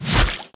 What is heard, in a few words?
helper slash; uumuu